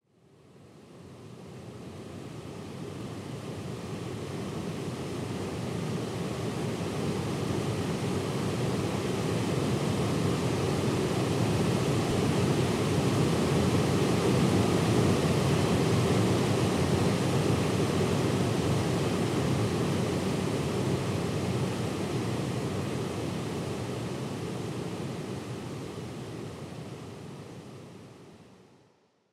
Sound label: natural white noise; sound oasis